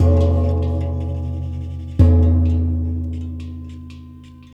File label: Gong; Ambient Grooves